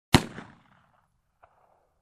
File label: Pistol shot sound medium distance